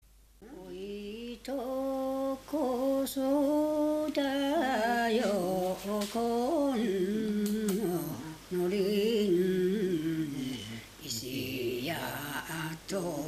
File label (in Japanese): oitokosoudayo, minyou; 印西市